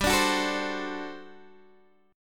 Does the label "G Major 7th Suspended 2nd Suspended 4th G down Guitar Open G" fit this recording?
Yes